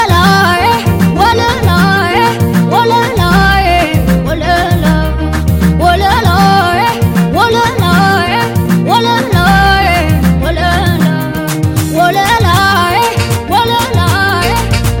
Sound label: Ringtone